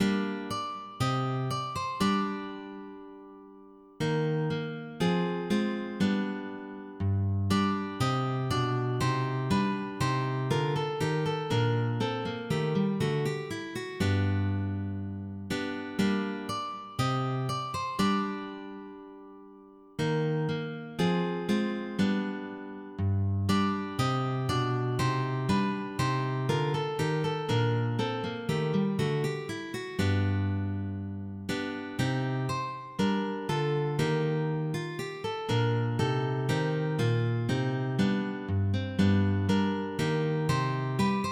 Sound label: Chorea Polonica